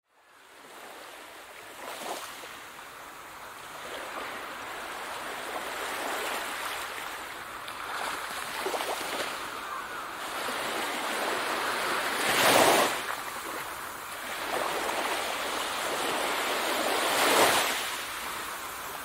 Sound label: Natures Harmony alarm sound; Mudita Bell